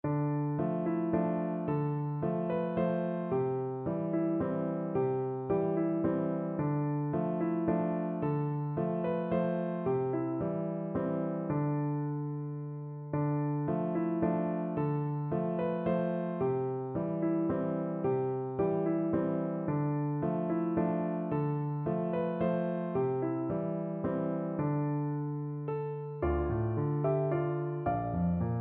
arran boat song piano

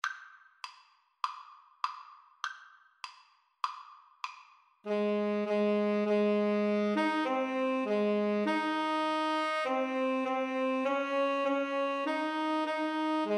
Alto Tenor Sax Duet

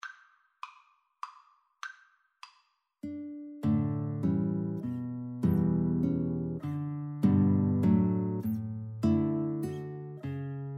hereford, Guitar-Flute Duet

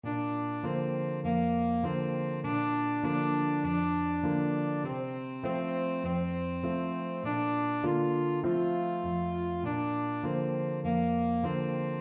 tenor saxophone

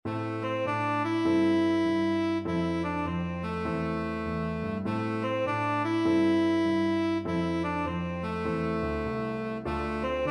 tenor saxophone

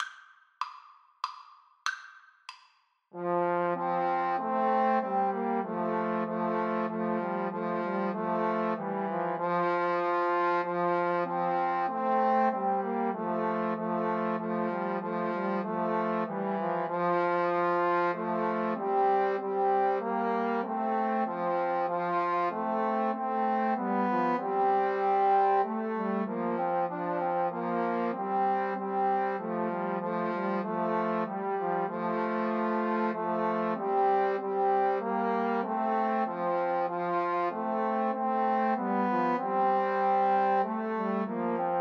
Christmas Hymn trombone trio

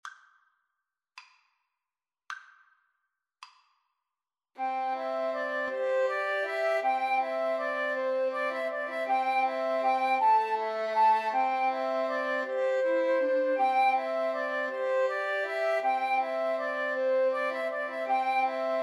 Piano Trio

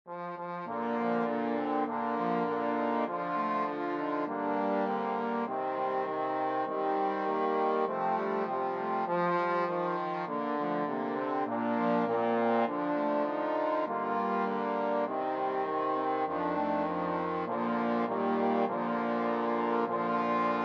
simple gifts, trombone quartet

